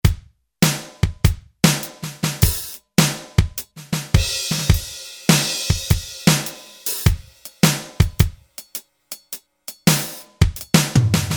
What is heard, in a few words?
KIT Nashville Kit; Roland L-CDX-01 Rhythm Section Instruments